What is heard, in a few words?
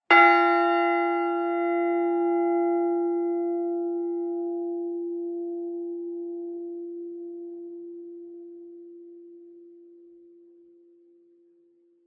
bells, fairwarp